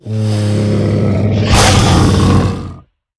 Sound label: worm striking bridge